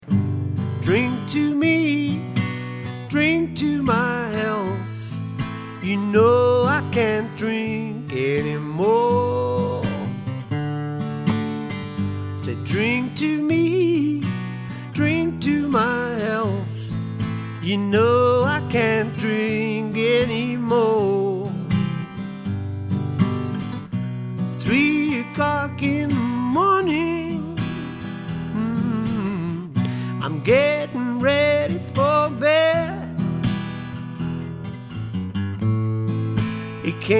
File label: Acoustic Version